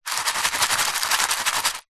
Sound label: stones very small in tin shake; foley